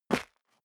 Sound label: step sneaker concrete grit single stair up; schritte